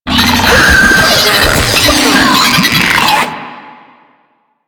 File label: Brachiolyst Idle, Vocals; Enemies; Warframe; Proto Infested